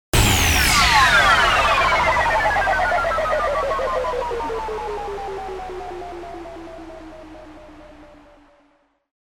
ELECTRON BURST IMPACT

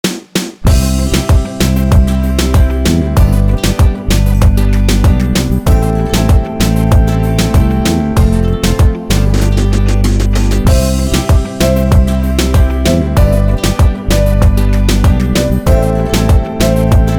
REGGAETON GIRL INSTRUMENTAL

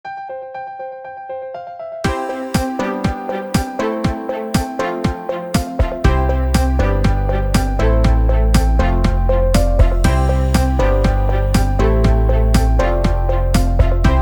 CORPORATE INSPIRATION

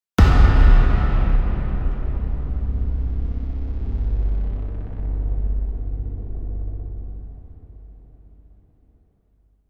RISING DARKNESS IMPACT

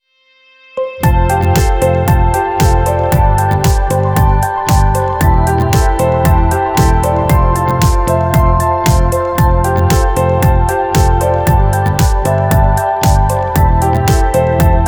INSTRUMENTAL

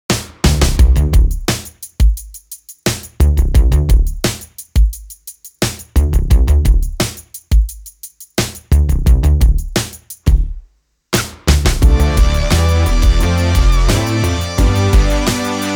instrumental

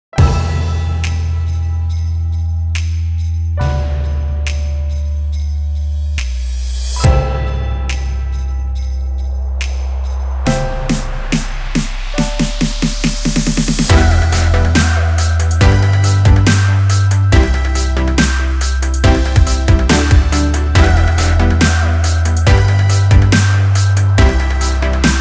ONE HORSE OPEN BASS DROP INSTRUMENTAL

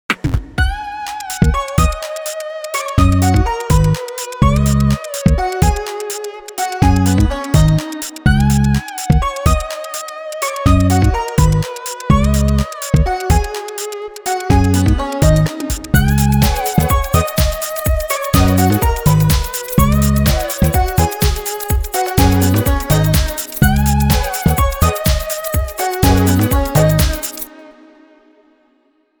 instrumental

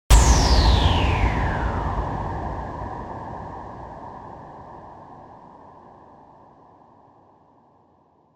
RAUCOUS IMPACT

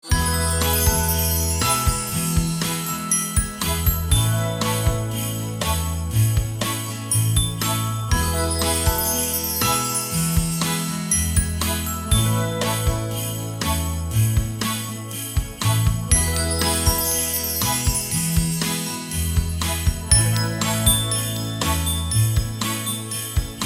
CHRISTMAS GUITAR WALTZ

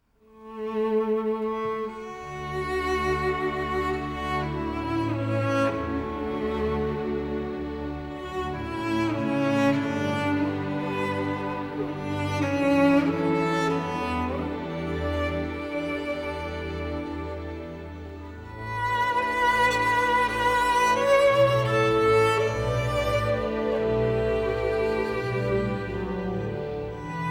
FIRST MOVEMENT

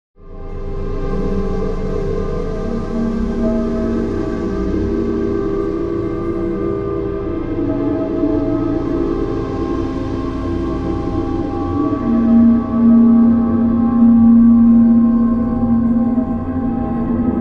HARD GRAVITY DRONE

